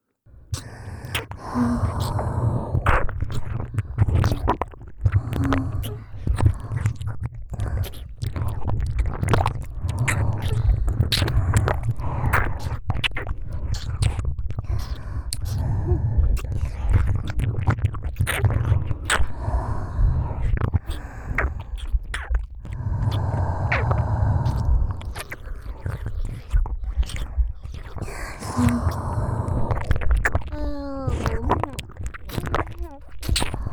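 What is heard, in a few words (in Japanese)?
フェラ・乳首舐め+耳舐め ループ（両耳）; ASMR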